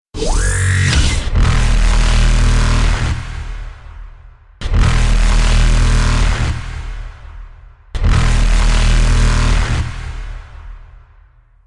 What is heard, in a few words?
horn text; ringtones; games; reaper